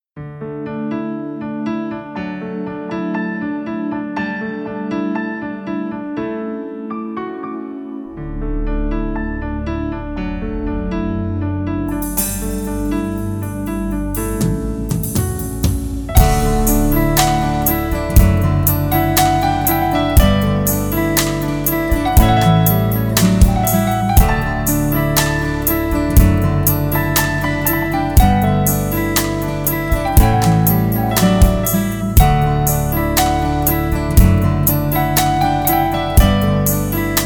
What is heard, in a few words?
Theme Music (Instrumental); Thulluvadho Ilamai (2001)